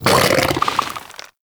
Bug Vocal Tranquillized Grunt; Characters; Warframe; Hunting Critters; InfKDriveBug

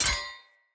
Short Sword Impact Parry Sword; Weapon Impacts; Enemy; Swords; Sinecure Short Sword

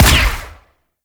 Pistol Silenced Shot; Operators; Drifter

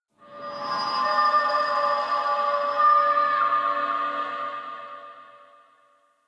Root Cave Psychedelic Moan Texture; Ambience; Neathuns Root Cave

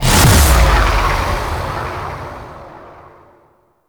Feast Impact Two; Warframe; Revenant

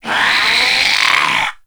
Mariner Zombie Attack, Vocals; Grineer Mariner; Warframe

